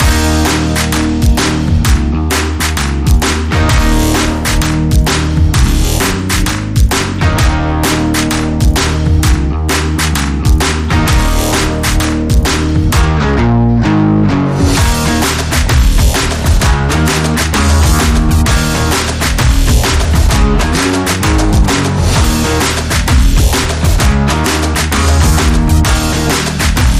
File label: No Lead No Brass